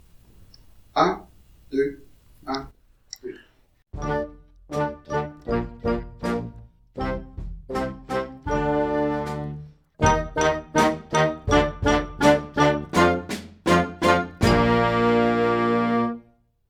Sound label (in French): accroche encore noire a 80